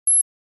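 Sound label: Flash Beep Electronic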